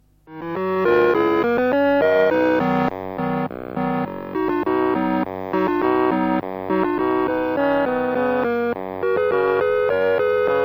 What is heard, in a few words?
TI994A